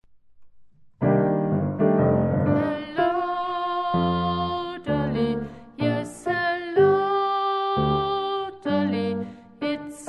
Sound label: Sopran 2; Sopran 1